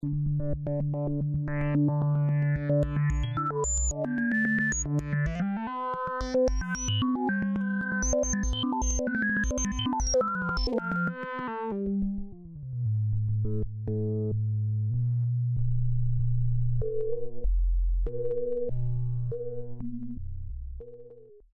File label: Nord Filt Seq